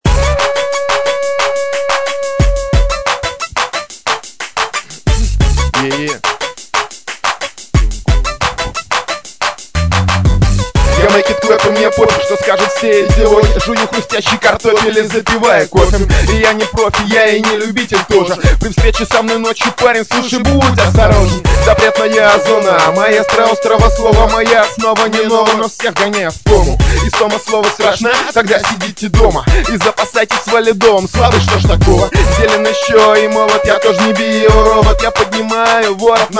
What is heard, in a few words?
clap